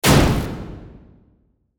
Hit Crunch Impact; Reverb; Foley Crunch Grind Rock Salt; Crunch Sound Effects

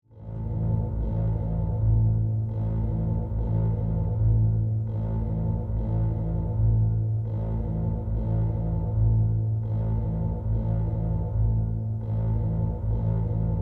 Drone Synth Pulses; Blast Wave

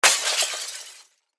Crash Glass Debris Impact; Crash Glass Debris Impact Smash; Debris (Sound Effects:Materials); Blastwave

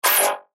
Beep Tone Signal Multimedia; Web Interactive; Blastwave; Technology

